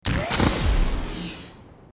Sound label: gun sound